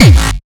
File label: Kick